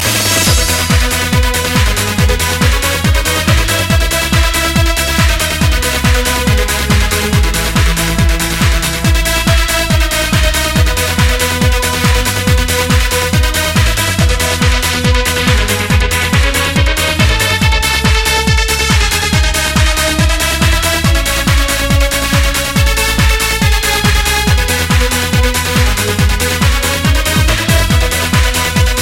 Trance

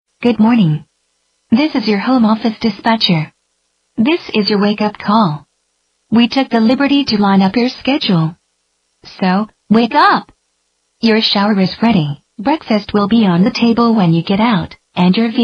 Pleasant Morning

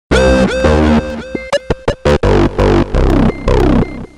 Electric Alert Tone

